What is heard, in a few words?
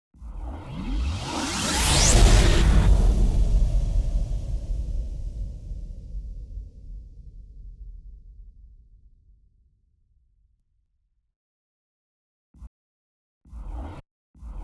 RISE HIT